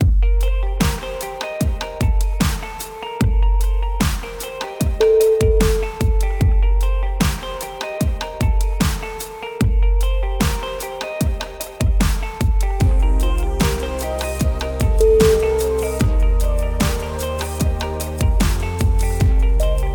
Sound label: urban beats